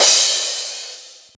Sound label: Crash